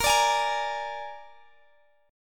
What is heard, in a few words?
Bb 7th Bb down Guitar Half Step Down